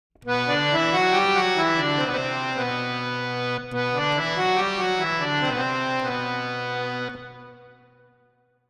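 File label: harmonium 3-4 70 verb; Melody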